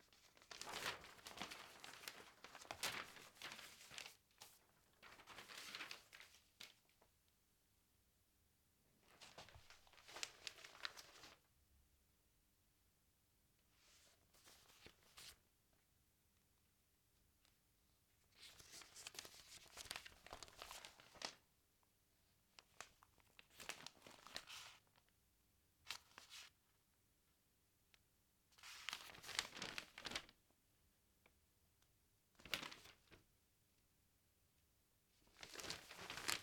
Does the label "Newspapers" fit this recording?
Yes